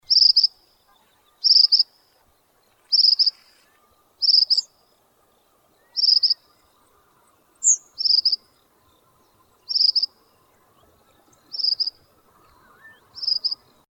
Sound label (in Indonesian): suara sepah padang